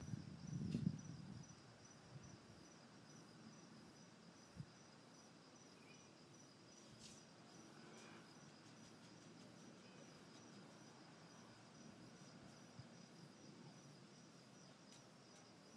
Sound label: sound at night from my balcony